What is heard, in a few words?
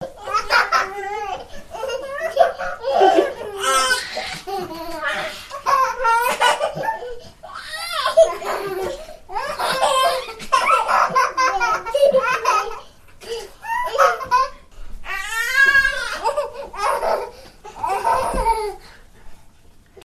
Kids Laughing